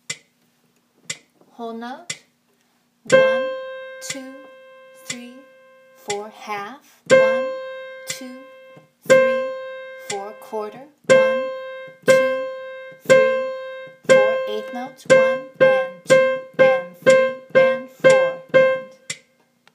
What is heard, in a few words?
Rhythm Example